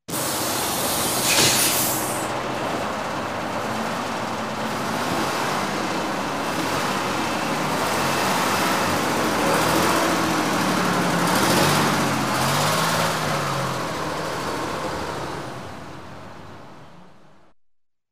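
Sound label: bus brakes releasing handbrake departure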